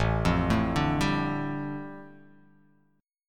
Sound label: A 7th Suspended 4th Sharp 5th, A, down